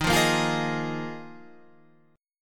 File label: D sharp Suspended 2nd Suspended 4th, Guitar, Standard; strummed; JGuitar